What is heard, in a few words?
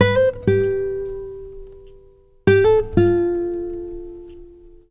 Acoustic Guitar; Guitars